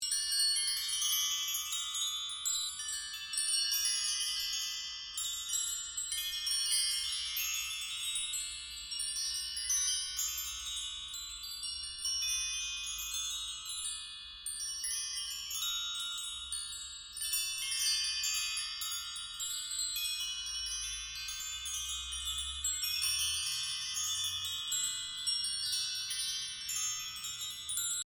Windchimes Random Gentle Loop, Orchestral windchimes